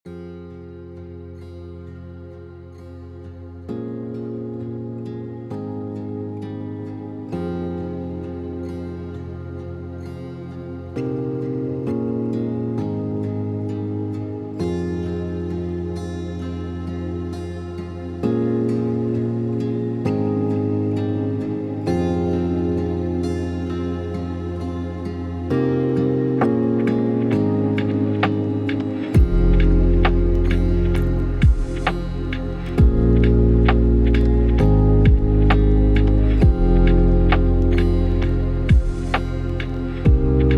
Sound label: cozy home winter evening open window